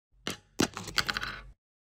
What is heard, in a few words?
Dropping pencil; افتادن; افتادن مداد